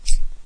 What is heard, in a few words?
scissors small open; household; arttools; stamps